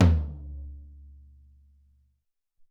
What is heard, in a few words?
16 Tom, Alesis SR-18